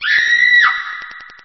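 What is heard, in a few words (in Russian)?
женский голос возглас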